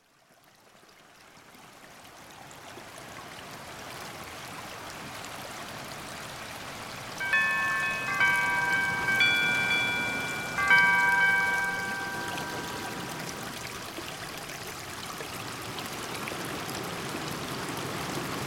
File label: Google Pixel Alarm